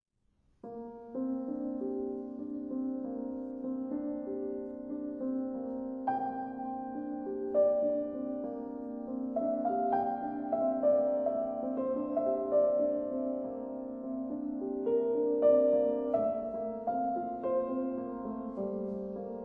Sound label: Reverie; DeBussy